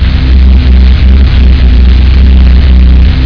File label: airdie; Uprising